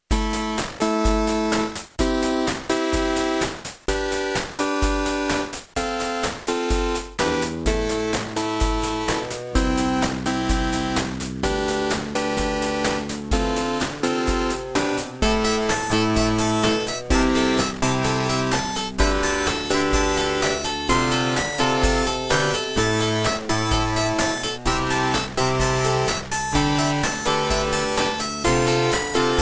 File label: Raptor Wave 1 Beepblaster 2.0 SYNTHMODE; raptor call of the shadows